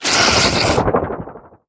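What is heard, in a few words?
Teleporter Stealth Attack; Evolve; Wraith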